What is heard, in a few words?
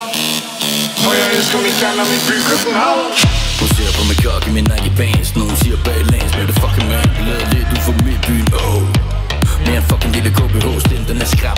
DISTORTION; KØBENHAVN; mobiltelefon